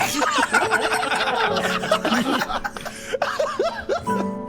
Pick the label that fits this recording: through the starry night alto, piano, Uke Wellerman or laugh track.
laugh track